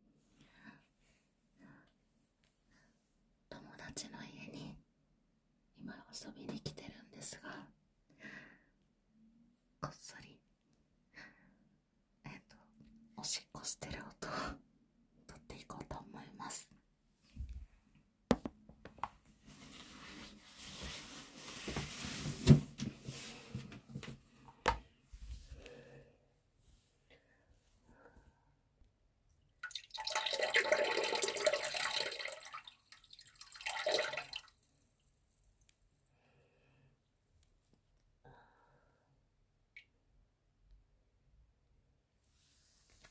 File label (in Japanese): 友達の家のおトイレで！オシッコですッ！